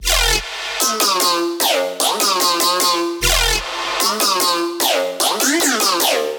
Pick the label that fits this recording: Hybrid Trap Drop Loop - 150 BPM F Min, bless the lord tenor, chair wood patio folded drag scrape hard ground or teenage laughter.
Hybrid Trap Drop Loop - 150 BPM F Min